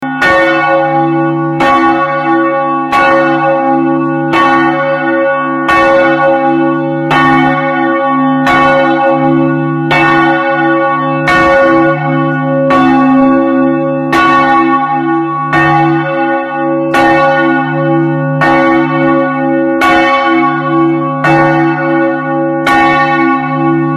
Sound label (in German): Glocken High; Glocken Meschen